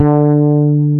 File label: FLS BS HI, FRETLESS